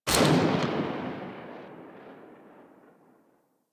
Tommy Gun Tail; Weapons; Warframe; Tenno

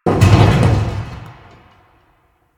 Large Vehicle Creak; Ambience; Props; Warframe; NineteenNinetyNine

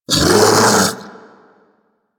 Infested Splitter Split Vocal Attack Medium; Warframe; Grey Strain

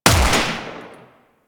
Shot 3D, Rifle; Warframe; Eidolon; EidolonEliteRifleLancer

